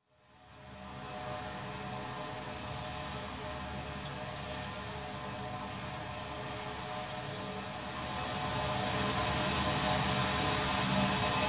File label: traffic and radio sounds in metal sign, Tampere